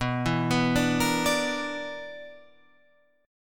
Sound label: B-Minor Major 7th Double Flat 5th B down